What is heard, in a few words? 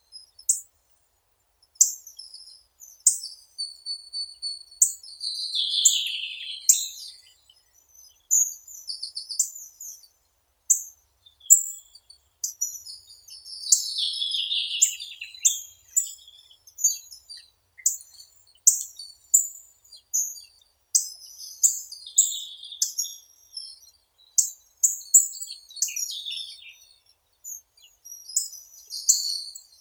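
hawfinch, Coccothraustes coccothraustes; dubonos obiknovennii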